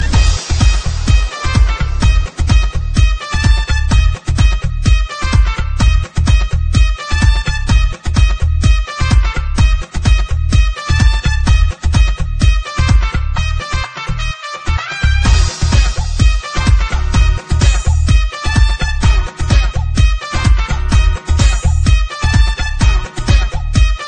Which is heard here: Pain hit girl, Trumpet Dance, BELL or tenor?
Trumpet Dance